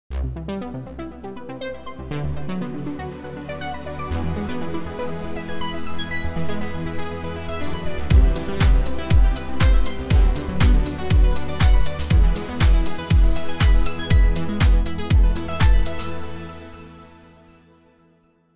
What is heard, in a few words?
analog synth; sound library; logic pro